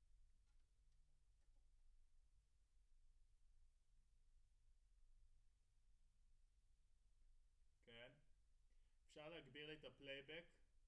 Room A

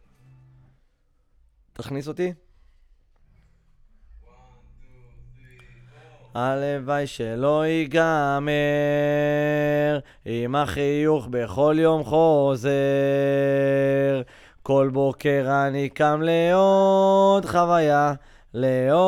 VOC GUIDE

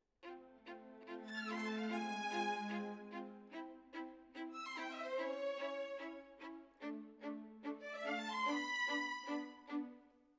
Violins, jazz for piano

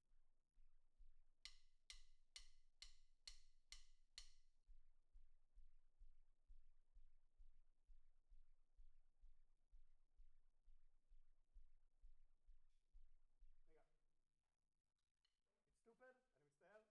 Snare Bot

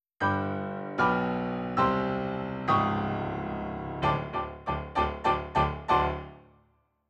RnR Piano

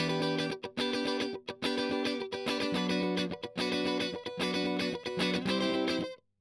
Strum

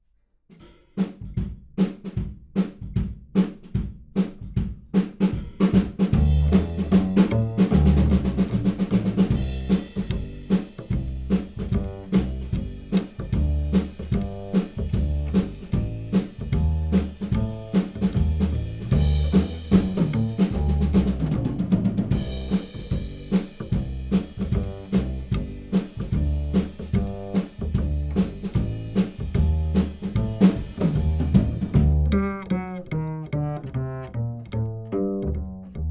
Bass

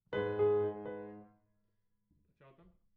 Piano A

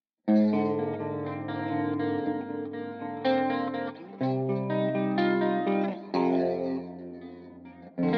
Guitar

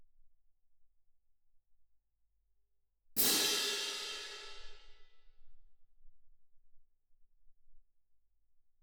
Twin R Perc

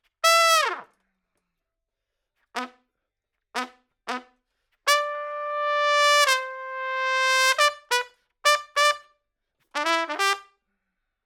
Trump

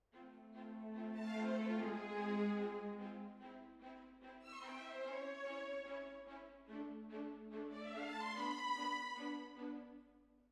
Hall

